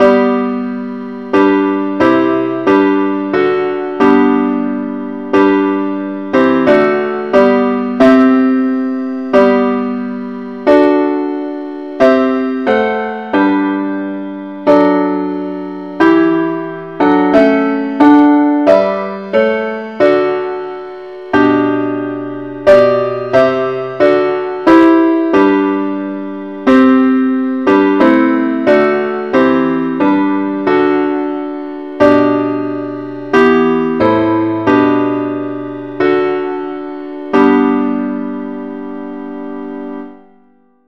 parts only, key g; christe Sanctorum

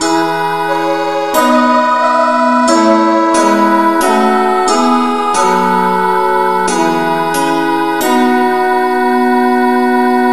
no vocal, key E; Gwalchmai; Glory be to God on high

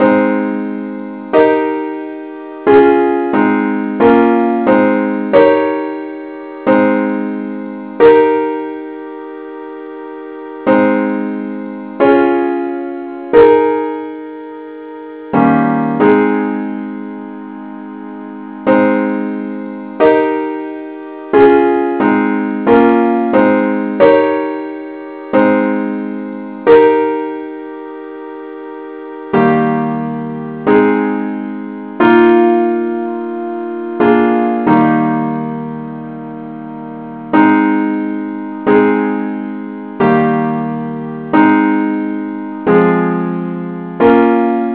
simple Acc key aes; Gwalchmai; Glory be to God on high

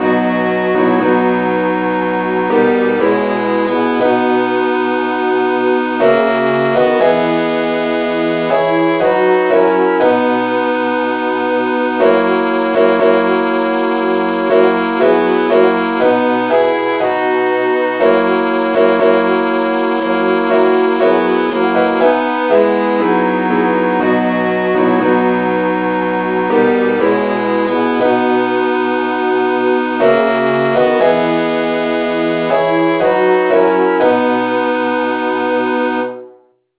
jspm satb key e

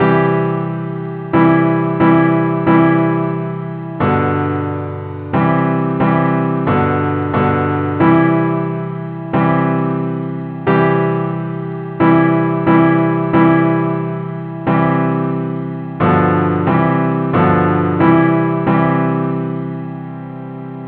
simple acc key c

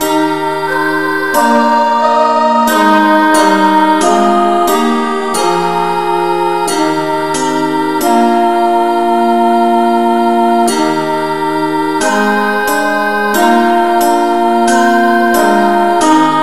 revnovocal keyees

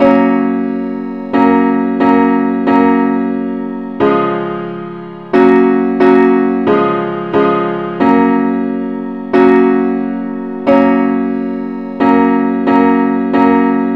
simple acc key fis

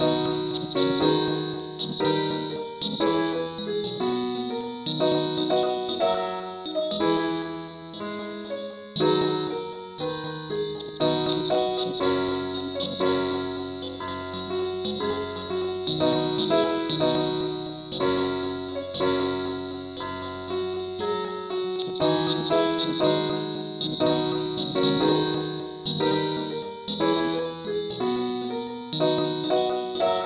ukelele key b

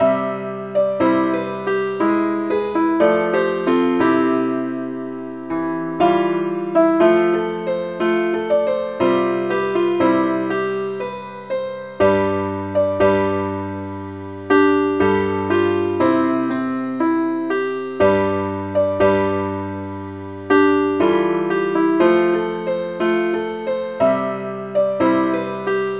keyboard Only key F

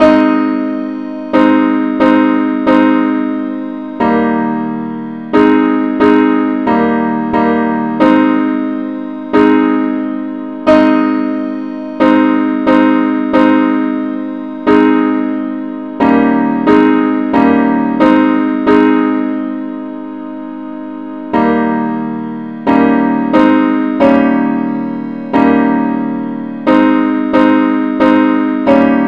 simple acc key aes

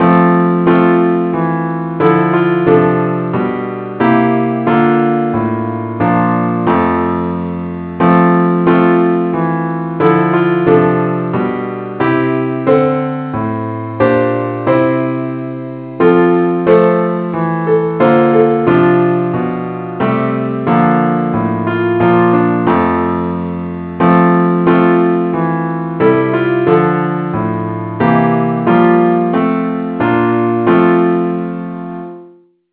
parts only key f

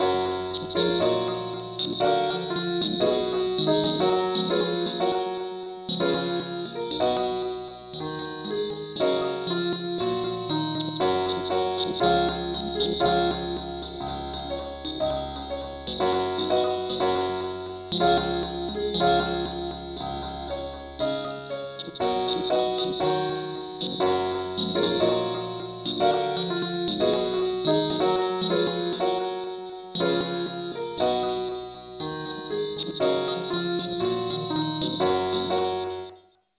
ukelele

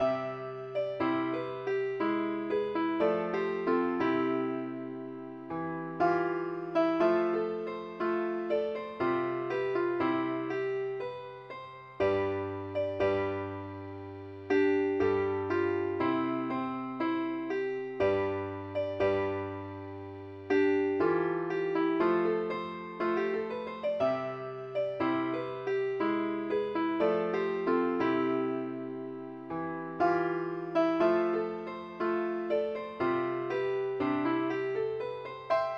keyboard only key C

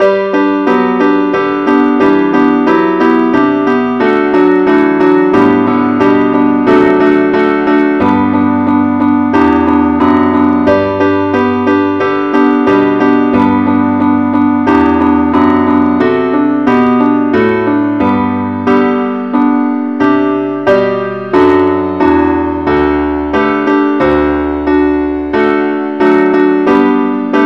keyboard only key g

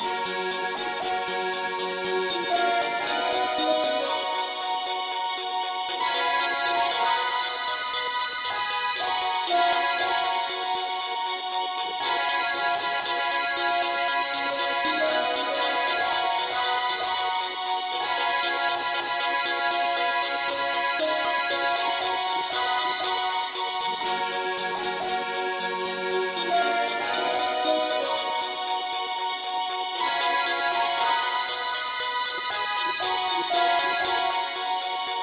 mandolin Lead key ges

